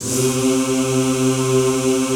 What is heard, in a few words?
ZZZ C2, Classical Choir; Best Service; Extended Classical Choir